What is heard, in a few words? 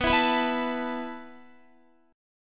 B 7th Suspended 4th B down Guitar